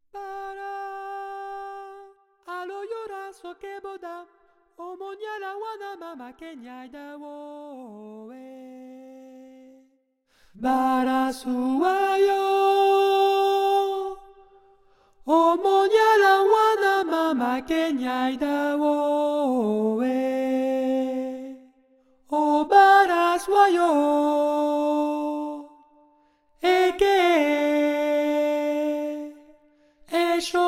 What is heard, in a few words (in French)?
alto; canto a eleggua